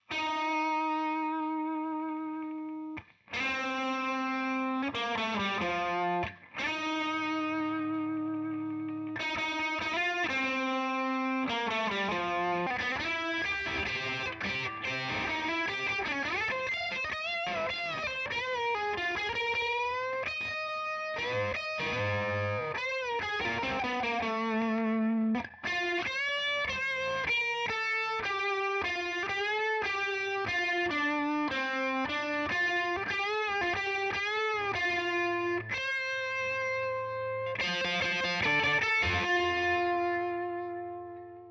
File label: lead gtr